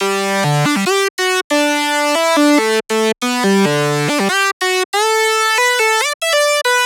Melody G 140 Glider, Color Bass Essentials, Melodic Loops; Ghosthack - Color Bass Essentials